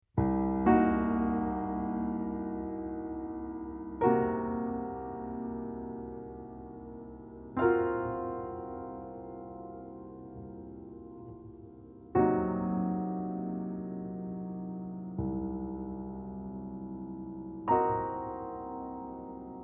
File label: Cmin7b5